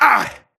grunt hits; Titanfall 2

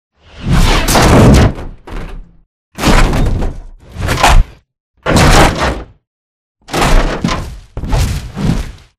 flyer dropship attack vertical close; boneyard; Titanfall 2

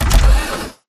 Mvmt FS Land Default; AI Stalker; Titanfall 2

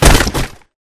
spectre chest punch grunt; Titanfall 2

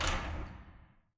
radar tower start clunk; Titanfall 2

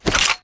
ammobox ammorefill; coop; Titanfall 2

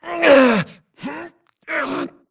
grunt Struggle; Titanfall 2

